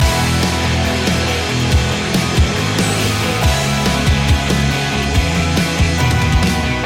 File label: the race loop